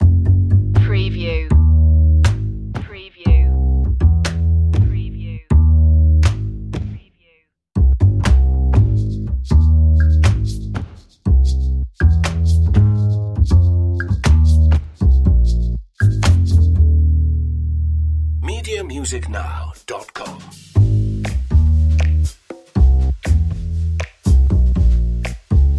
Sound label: funny mystery drums and bass